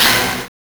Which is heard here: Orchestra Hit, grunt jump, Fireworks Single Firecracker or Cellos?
Orchestra Hit